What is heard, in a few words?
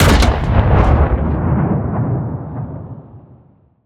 tank artillery cannon shot, Explosion Sound Effects; Bluezone